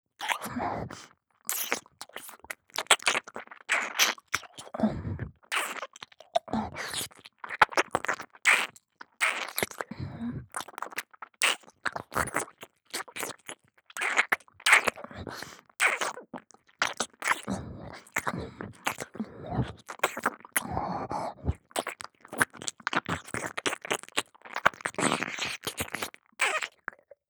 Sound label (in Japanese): 耳舐め・激しい